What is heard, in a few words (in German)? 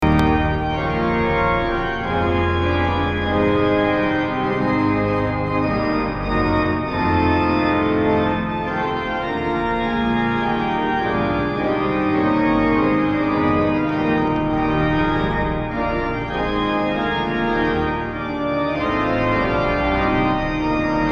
Marienorgel; kath. Marienkirche, Witten